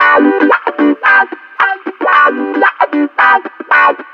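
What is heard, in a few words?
guitar 1, 112 bpm, Funk; USB Soundscan vol.41; AKAI; Roots Funk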